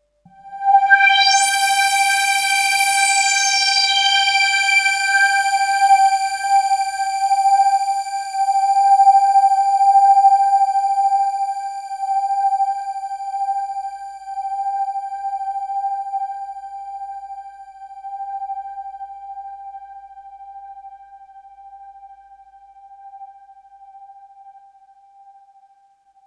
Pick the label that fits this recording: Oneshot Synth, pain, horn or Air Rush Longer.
Oneshot Synth